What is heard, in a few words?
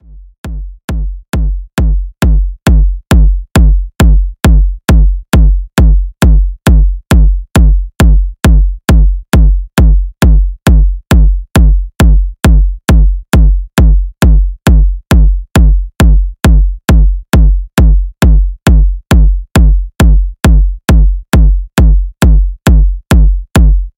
signature kicks; zumstein